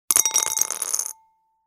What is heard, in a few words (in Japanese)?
チンチロ サイコロを振る 小丼